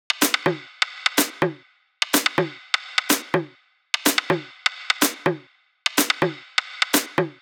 Cube Drums Percs, 125

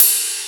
Ride

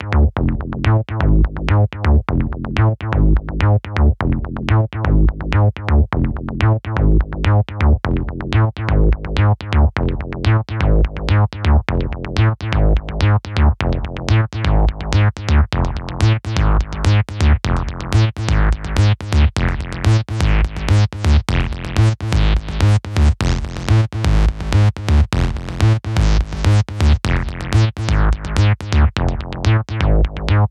Synth Loop, Ab, 125bpm

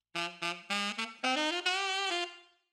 Sax Pop 112bpm; F Major; F Minor; Bb Major

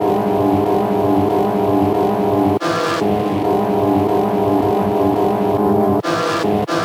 140 SYNTH LOOP F

